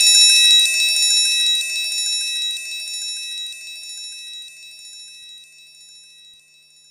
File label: Triangle Roll, Korg 05R-W Korg Percussion; 300 Drum Machines